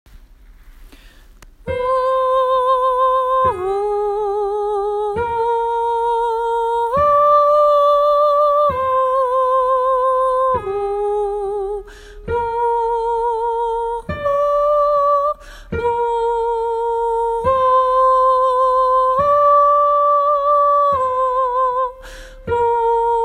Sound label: tenor; åfjord